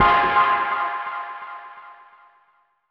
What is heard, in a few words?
Pablo Stab, Musical FX; Zero-G Smoke